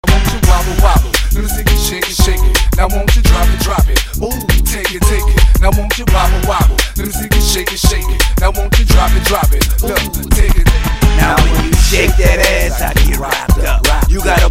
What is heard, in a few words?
wobble wobble ringtone